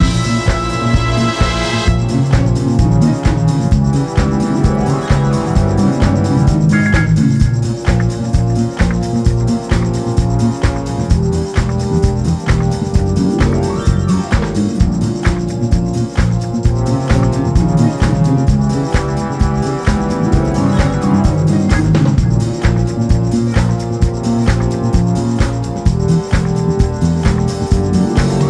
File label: Bari Sax